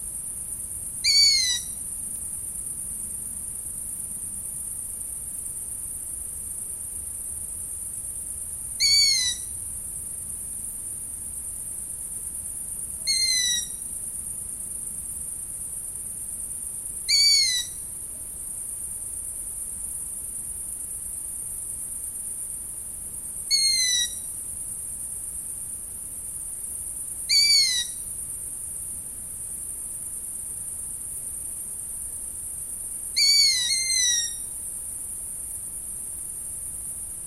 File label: Long eared Owl